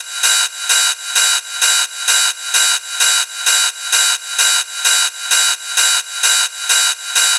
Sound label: Ride Loop 130, Techno; Techno Sounds 2024; Rides